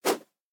Dummy Attack

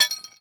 Physics Object Wrench Impact; viewfinder

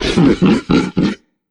Troll Monster Laugh

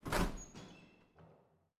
Door Enter

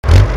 Tank; The Henry Stickmin Collection

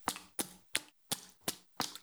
Run Footsteps Water

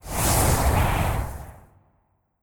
Wind Sirocco Impact; Legend of Keepers

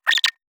Player Idle

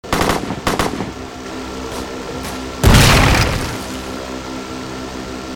Magnet; The Henry Stickmin Collection

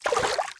Swimming Splash; MiniGames

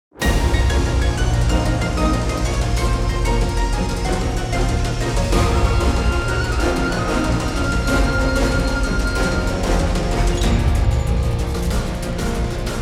Battle Boss; Type Knightgearcrimson

